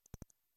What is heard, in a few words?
P. pygmaeus, bats